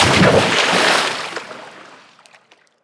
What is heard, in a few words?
Splash, Water